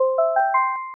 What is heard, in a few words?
win fanfare; Ferrous Solitaire